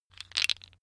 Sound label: tiles wood